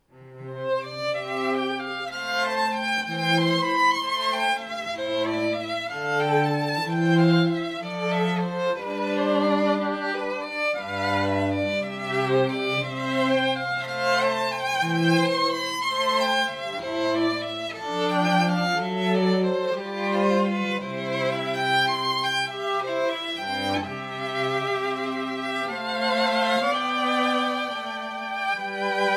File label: string trio; Jesu